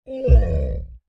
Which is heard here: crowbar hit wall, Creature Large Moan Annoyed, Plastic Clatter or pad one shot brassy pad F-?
Creature Large Moan Annoyed